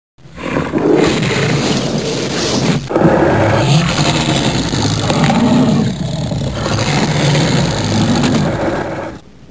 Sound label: elevator